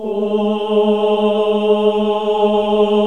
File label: OOH G#1; Best Service Gigapack 1